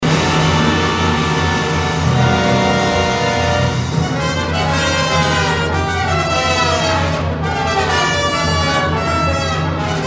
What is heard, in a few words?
Trombone concerto; Šervenikas; Malcys